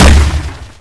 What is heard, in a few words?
fire; poke646